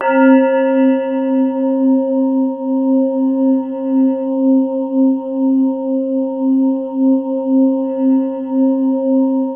BELL EPIC

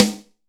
AMB SN, AMBIENCE SNARE

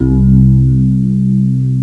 Ending dx piano; jazz jackrabbit 2

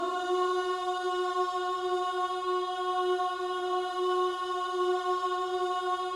OOH F#4, Gospel; Spectrasonics Vocal Planet

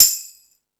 Discoball, drum machine

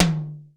01W TOM, drum; Crypton - DrumBase5000

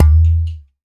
BIG POT, POTS + PANS; Spectrasonics; Liquid Grooves

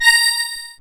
BELL-GONG, A#; Big Fish Audio

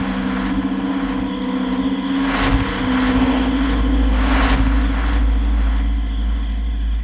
ambience

